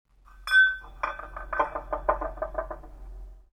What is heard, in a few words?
Glassware